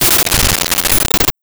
Rhythm

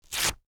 paper rip; Books and Paper

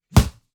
Punching Percussion, Stick Hit, raw; 99Sounds

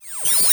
door Close; Kenney's Sound Pack; Sci-Fi Sounds

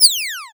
minimize, Interface Sounds; Kenney's Sound Pack

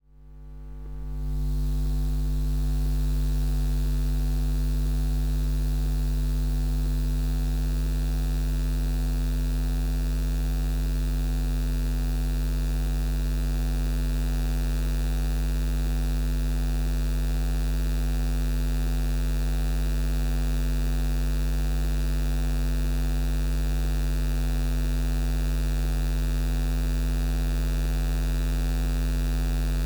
EMF Dishwasher, Electromagnetic Field & Emissions; Fox Audio Post-Production

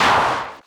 Clap; 99Sounds; Dark Engine; Burnt Hits; Snares Claps

